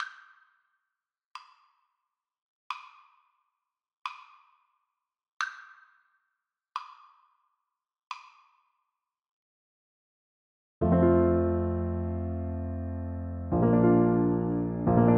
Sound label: French Horn